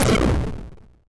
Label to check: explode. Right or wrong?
Right